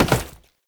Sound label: Step Glass, Foley; Warframe; Oraxia